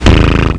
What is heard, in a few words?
Missed It; Apeiron 1.0.2